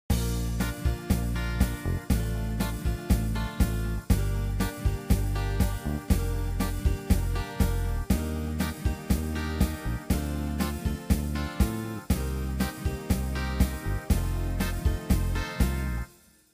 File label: 60s folk rock